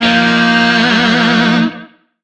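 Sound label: Shawzin Pentatonic Minor Chord; Warframe; Lizzie Shawzin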